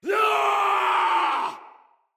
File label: Battle Cry, Soldier Male; Dendrit